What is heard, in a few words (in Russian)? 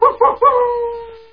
dikij kojot voet